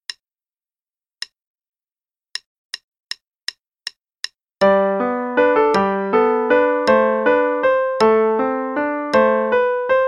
Play-along Valsette, qn=160; Vogel

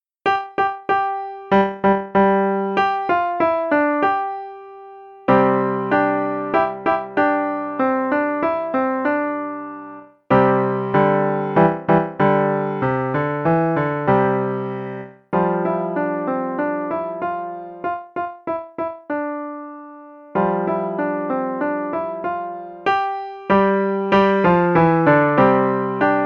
Square Dance, no click, qn=192; Level 1 Performance

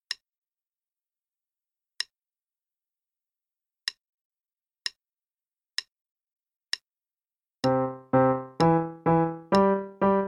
'Surprise' Symphony (qn=64); Faber Adult Accerlated; Adult Accel Level 1

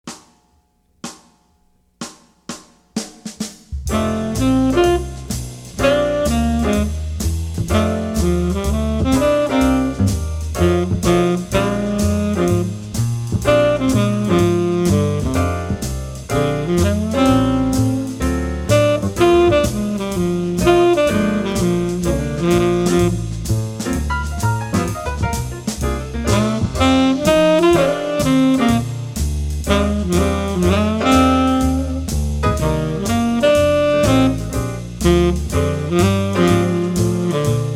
Blues, trumpet; 15 Easy Jazz Etudes; CM Level 3 Solos (jazz)

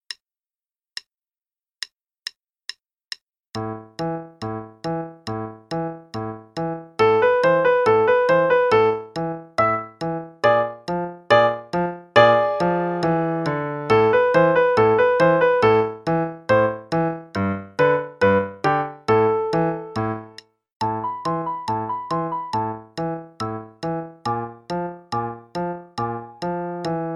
Play along, qn=140; Halloween; Bastien Level 3 - Pumpkins Dance at Midnight

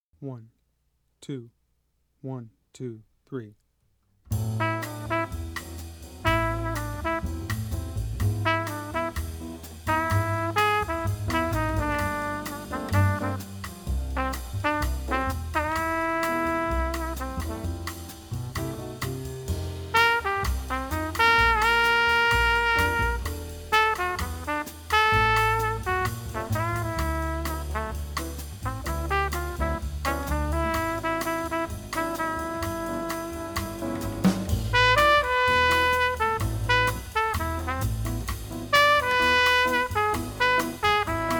Bossa at Night; jazz; easy jazz conception

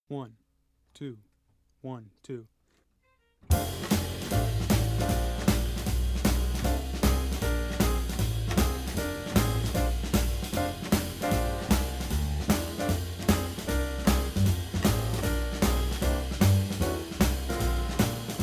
Shufflin' In F (rhythm only); jazz; easy jazz conception; CM Level 3 Solos (jazz)

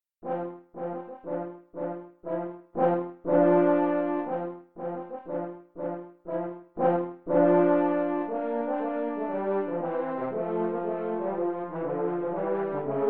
Easy Classic Duets March, French Horn; Nutcracker

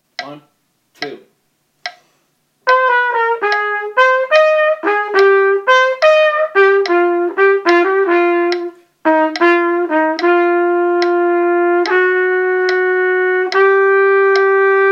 Play-along, click, qn=72; Chet; trumpet

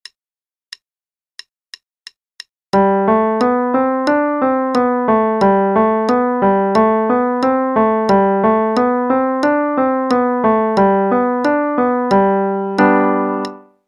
G Pentascale Warm-up, qn=180; Adult Level 1 - page 91; G Pentascale Warm-up 2

